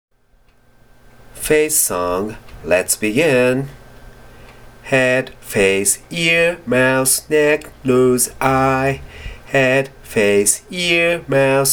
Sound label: Face Song